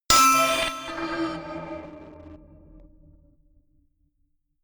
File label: notification glockenspiel crazy third; instrumente